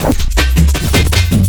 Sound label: LOOP L, Drum & Bass 160 BPM